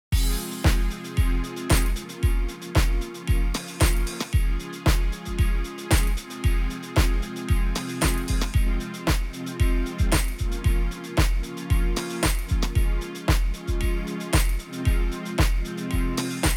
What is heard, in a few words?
Sound Design Sidechained Snares to pads